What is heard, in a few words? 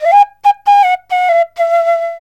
SERULING, Heart of Asia; Spectrasonics; SERULING PH